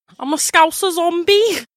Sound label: Scouser Zombie; DoughnutQuest